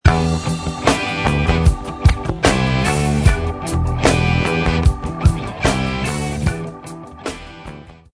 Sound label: aeolian funk